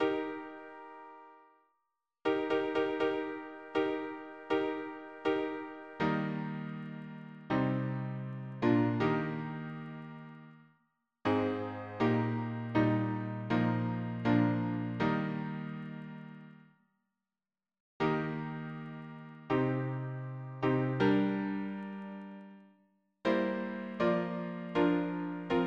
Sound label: harmony piano